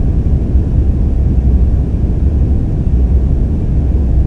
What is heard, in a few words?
ent hallway; trek